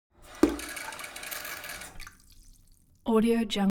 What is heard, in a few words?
elements water sink fill splatter medium short; smartsoundfx